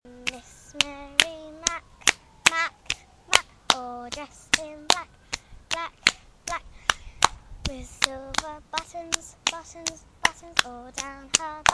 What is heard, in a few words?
clapping games